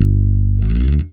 bass stab

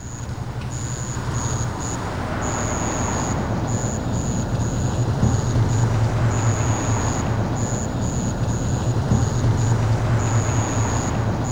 ATMOSFX; Best Service; Advanced Mega Effects

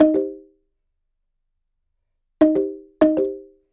Marimba 100bpm Eb, African; Quazibeat; African Secret

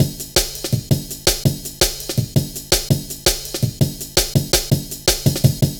Drum Loops, 165.5; Zero-G Jungle Warfare 1, 2 & 3

